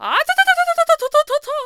Ladys Ensemble; Madame Couture creating the Lady's Ensemble (2 parts)

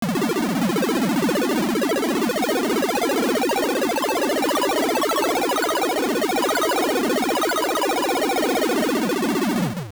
Seashell Mansion Meter Full; Seashell Meter completely filled at the Seashell Mansion

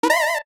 Boss Fireball; LTTP; Boss shooting a fireball (Helmasaur King; also Agahnim's magic ball splitting)

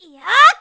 Pull Bow; Zelda pulling a bow to fire at Ganondorf

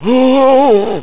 King "Hohhh"; King Daltus: "H'ohhh..."

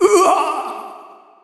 Fall; Gramps falling (out of the battle arena); zelda

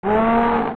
Moblin Roar; Zelda; OOT

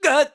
Hurt; Link; zelda sounds; Skyward Sword

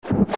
Snow Arrow

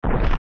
Split; Floormaster

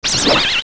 die; Tailpasaran

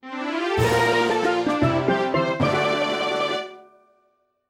Fanfare Goal; Complete a goal (also a new fishing record)

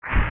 Enemy Poof

